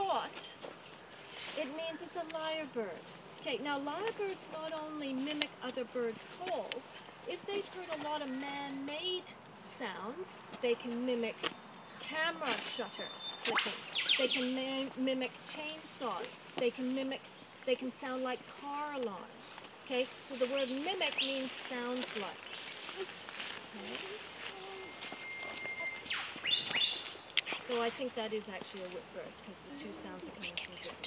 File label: whip bird